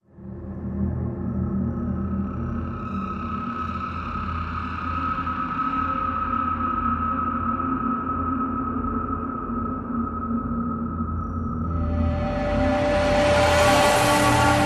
No Brass

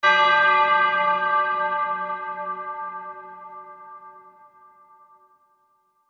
Light Church Bell

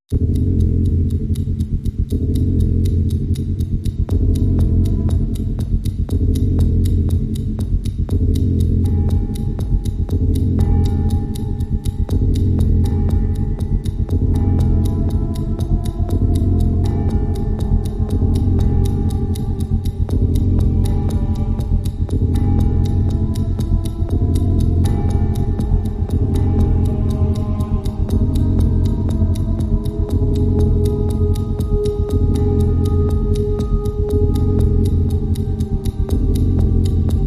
Dark Ticking Drone Full

